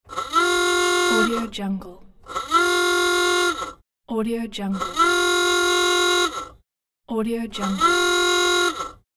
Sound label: 1917 klaxon horn blasts several times; cartoon sounds